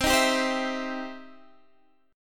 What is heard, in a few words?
E Minor Major 7th Sharp 5th, C